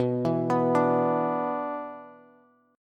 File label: B-Minor Double Flat 5th, Bass; Bmbb5 arpeggiated; JGuitar